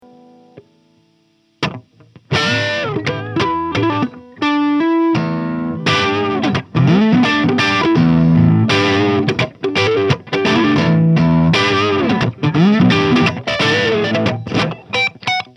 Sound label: Ibanez FRIX6FDQM BMG Iron Label Crunch